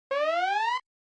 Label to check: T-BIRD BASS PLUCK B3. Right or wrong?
Wrong